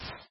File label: sand, step